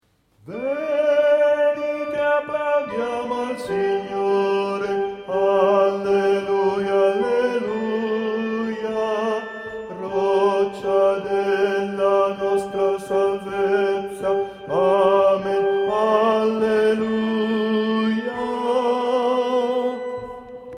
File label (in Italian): Tenori Venite applaudiamo; Visita Arcivescovo